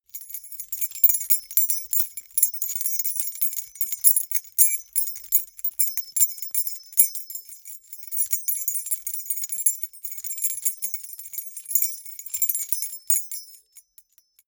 key ring small rattle air sequence hard

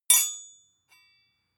sword hit ring soft rattle

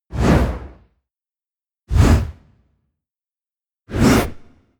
push

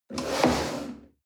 chair wood slide on floor hard bump

